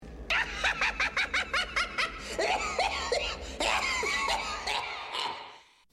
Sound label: evil laugh; tales from the crypt s02e02